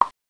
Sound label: pop